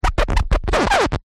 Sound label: Record Player Turntable; audio hero